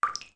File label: Beneath Well Droplet; zelda; OOT